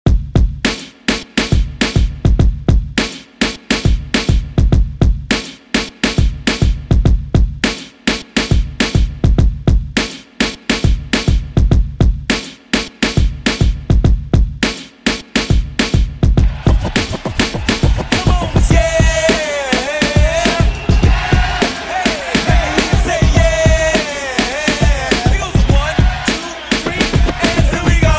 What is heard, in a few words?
Funk Grid Redrum 103Bpm